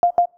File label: key tone, Keyboard sounds; Samsung SM-B360E Metro 360; Lucid keyboard